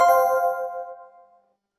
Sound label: notification sound